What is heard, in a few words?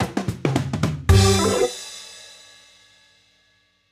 stage complete; when vikings attack